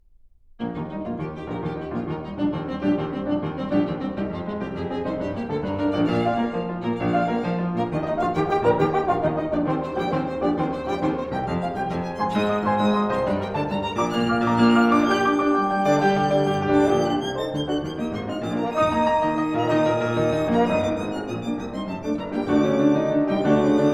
Trio for Piano, Violin and Horn in E flat major, Allegro con brio; Brahms Trio & Quintet